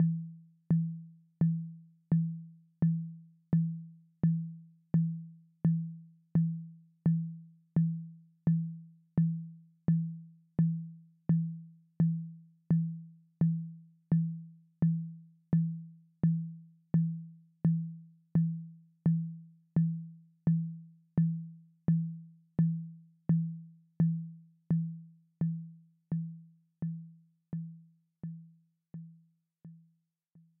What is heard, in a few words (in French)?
lent 170pdm